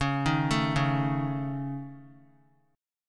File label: C sharp Suspended 2nd Sharp 5th C sharp; C#sus2#5; arpeggiated; JGuitar